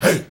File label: HEY HEY